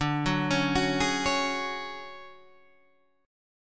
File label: D Major Sharp 11th D Guitar Half Step Down, chord